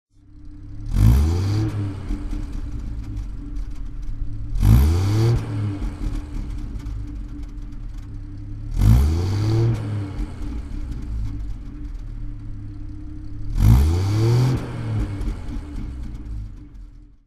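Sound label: bentley mk6 drophead coupe throttle; Bentley Mk VI Drophead Coupe, 1950; Engine sound; V3D/ ClassicarImages; Marc Vorgers; Sound driving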